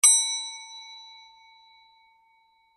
bell small, Bell (Small) Ring; Bell Ring ( Small ) - Could Be Reception , Elevator Or Bicycle Bell Etc; Musical; McKinney Sound

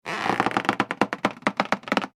balloon rubbing squeak; Balloon Being Rubbed With Hands - Good Cartoon Stretching / Expanding Sound; Funny Sounds (Sound Effects:Comedy); McKinney Sound

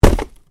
PUNCH; Various Organic Foley sounds; Punches and Hits (Sound Effects:Combat); SoundEffects-Foundation4

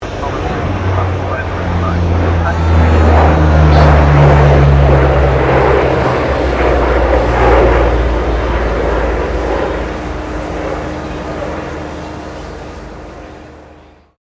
AP-3C Orion pass; RAAF Orion; Airplanes; Vehicles

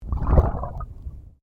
Underwater Movement Swoosh

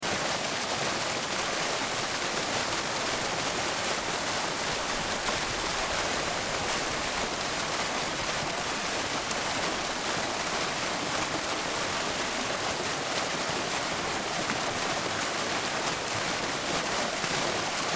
Fountain City, Water Fountain Exterior City Loop; Backgrounds; City Sound Effects; Cities; Blastwave

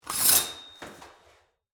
Shower Curtain Close, Locker, Room; Household; Bathroom; BlastWave

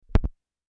Record Needle Down, Record Needle Set Down; DJ; Musical; Blastwave; Sound Effects:Musical

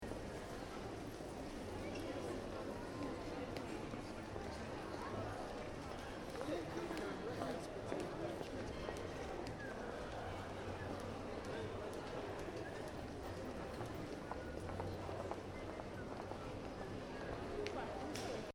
Shopping Mall, Interior: Ambience, Small, Uk; Stores and Malls; Stuart Duffield

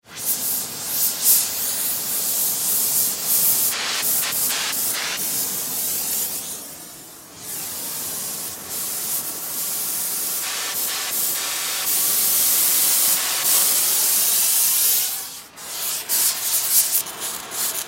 Blow Torch Sizzle Melt Metal; Welder; Industry; Welders

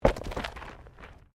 Body movement Impact Roll Gravel

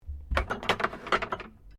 JARS GLASS SPICE RACK MOVE, Various kitchen jars; Household

